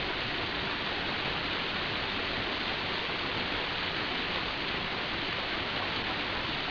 waterfall; jb nuke csbr

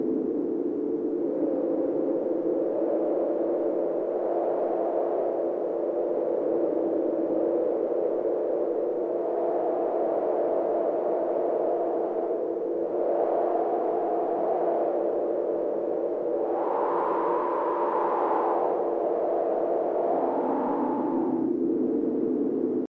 Ambience Wind; bog nomercy

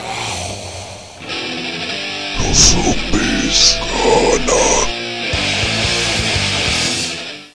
win zombies; zombie plague

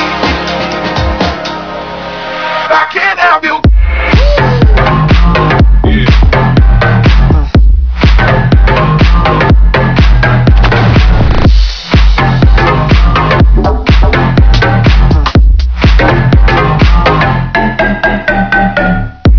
village music

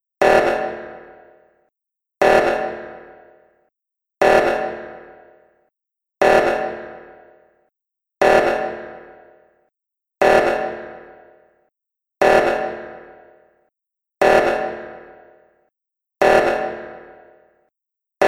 siren sound; ze amus b5

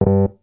wrong

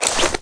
pick chest; cstrike; ZP CRISTIAN

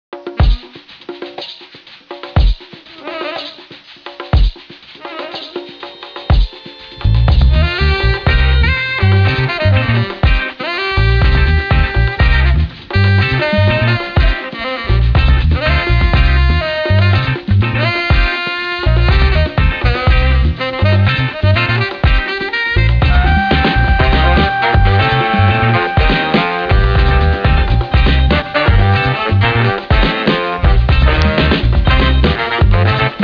music; jb nuke csbr

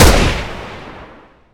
sg552; cstrike; ZP CRISTIAN; ZPB WEAPONS

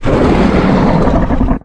trex grow; ze jurassicworld escape dp

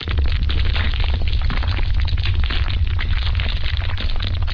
rock; jb nuke csbr

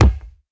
impact car

